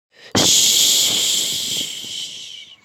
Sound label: Shh